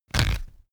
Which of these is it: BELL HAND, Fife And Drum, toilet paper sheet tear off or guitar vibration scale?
toilet paper sheet tear off